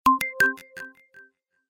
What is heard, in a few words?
Trisyllabic, notifications; Infinix; XOS 10